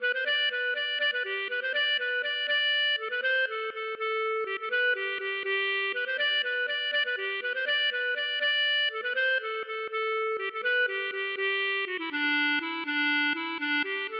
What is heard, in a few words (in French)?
bourree; Tournante